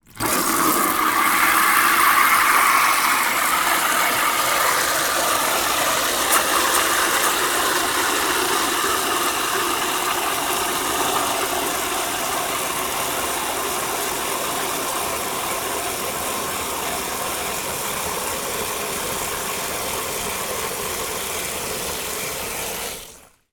household water spray into plastic bucket long fill